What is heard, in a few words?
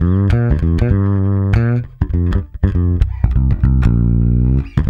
MEAN G, Bass; Spectrasonics; Bass Legends; MEANSWING